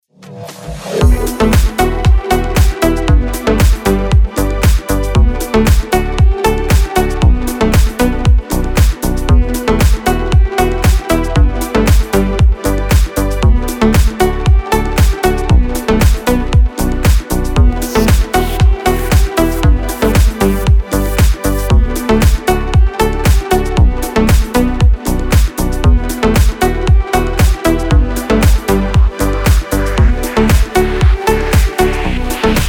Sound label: instrumental